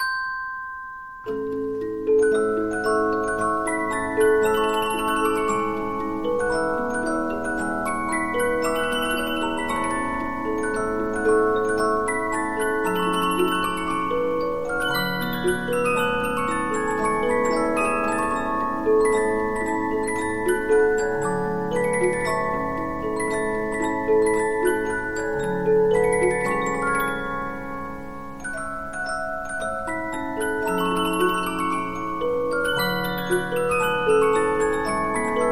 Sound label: Polyphon, 15inch disc musicbox